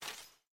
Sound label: file remove